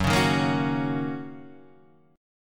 Fsharp 6th, guitar chord; F#6 Chord {2 4 1 3 2 2} strummed

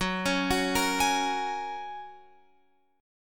Gb Major Add 9th, Guitar Open F

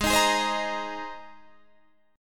Ab Major Add 9th Ab Guitar Standard; Abadd9 strummed; Abadd9 Chord; JGuitar

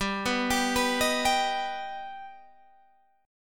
G Augmented G Guitar Open G

